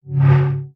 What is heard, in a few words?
Fire Extinguisher Hi; Daredevil